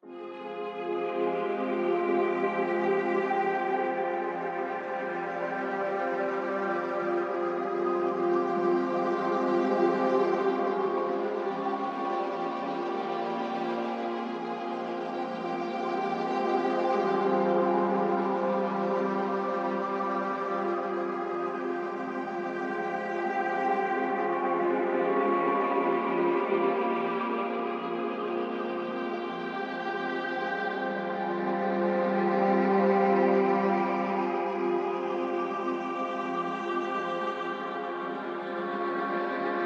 142 BPM F Min Pad, Drill; Loop Stems; Cymatics; Bastille

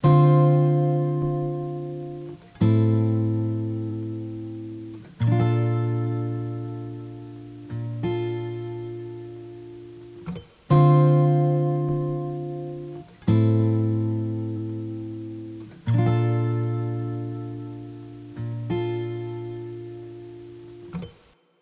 Acoustic Guitar Loop Wet - 90 BPM D Min; Melodics; Cymatics; Eternity Sample Pack; Guitars - Acoustic

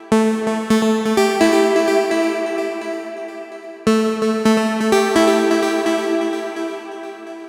Melodic Loop Ocean Amin 128, Melodic Techno; Ghosthack; AC2024 - Day 12 - Melodic Techno

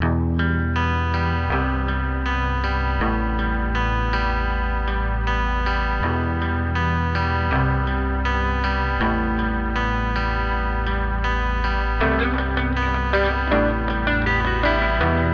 80 BPM E Min, Guitar; Abandoned; Cymatics; Miami Guitar Pack - Pt 1

